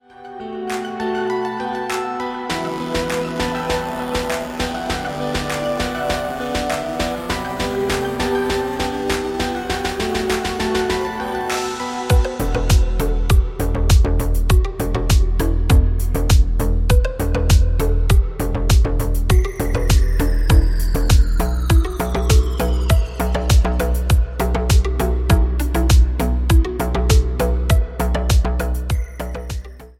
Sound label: Electronic Beats and Rhythms; MIUI14; HyperOS